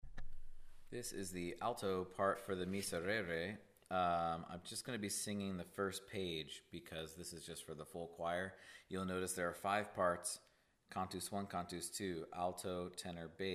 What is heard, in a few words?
Miserere Alto; Miserere, G. Allegri; Lent